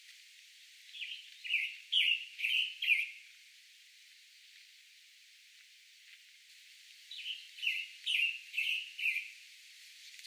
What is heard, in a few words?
Flame colored Tanager Piranga bidentata bidentata; birds-that-eat-grape-jelly